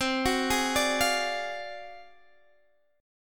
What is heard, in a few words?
D 7th Sharp 9th Flat 5th C, chord